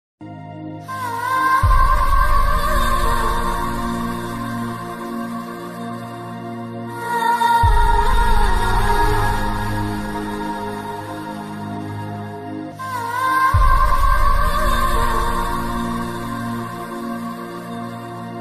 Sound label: Sad Background Ringtone; ringtones; Ek Villain